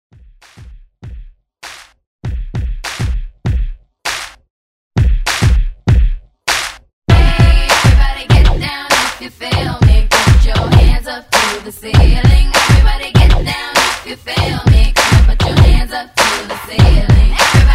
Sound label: Hype Old School